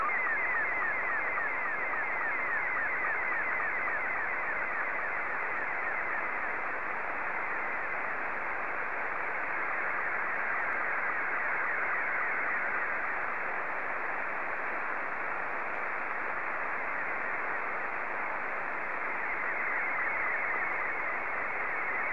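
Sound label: SSTV, Scottie 2; JA3OEN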